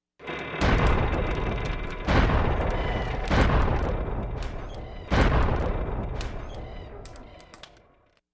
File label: TNT Barrels; Thornberries